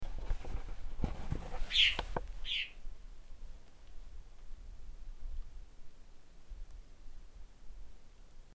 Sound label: Southern Martin; Golondrina Negra; Progne modesta